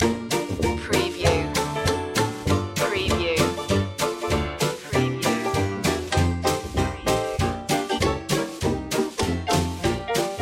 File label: charleston loop